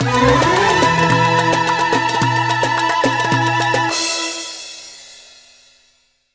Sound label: Jingle out win; Aladdin Magic Racer; Wii